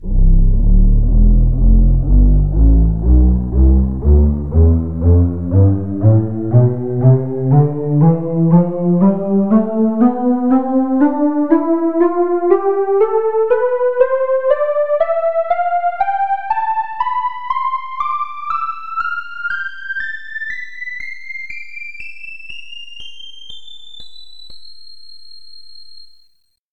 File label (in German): calliope lead tonleiter